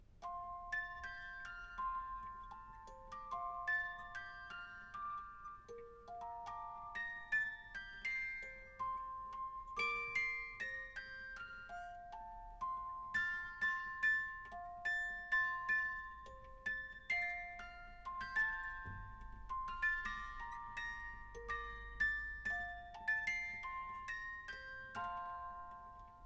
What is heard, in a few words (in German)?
Jingle Bells langsam